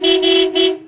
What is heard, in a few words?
horn; WhiteOut